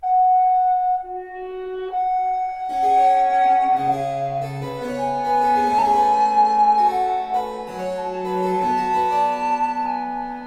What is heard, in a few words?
Sonata VI In Bb Major Sostenuto, Recorder and Basso Continuo; Francesco Barsanti; Sonatas for Recorder and Basso Continuo